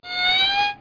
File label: violo; Microsoft Graphics Studio Greetings; Microsoft 1998